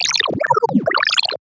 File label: FX Aquabot; Riddim; One-Shots; SFX; Ghosthack; Riddim Essentials Volume 2